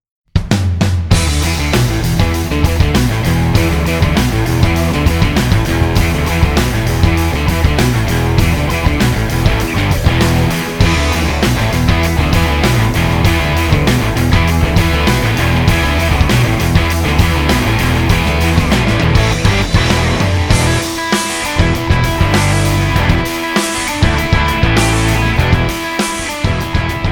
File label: pulse